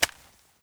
smg1 clip hit; weapons

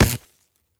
stunstick flesh hit; weapons

